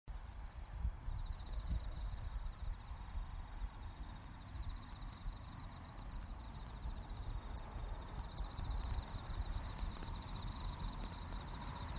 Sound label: grasshopper